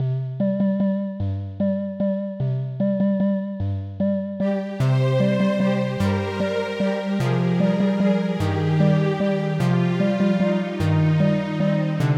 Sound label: Waltz